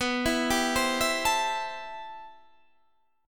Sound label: C Major 13th B